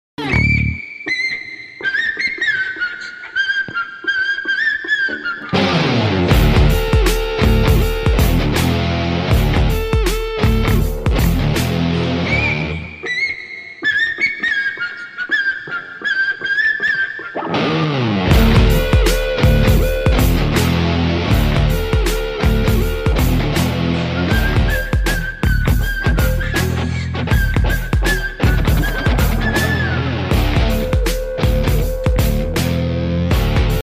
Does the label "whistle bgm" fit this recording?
Yes